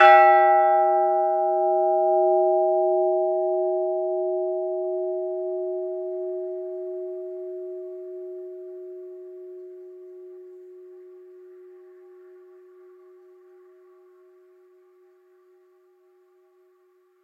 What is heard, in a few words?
brighton old forth, bells